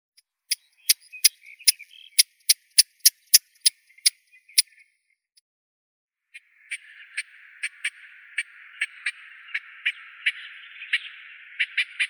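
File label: olivaceous warbler, Hippolais pallida; peresmeshka blednaya; zvuki prirodi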